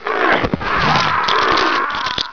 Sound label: party slain; Exile III v1.0